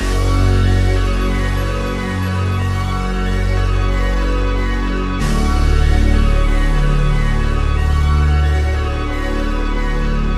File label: keys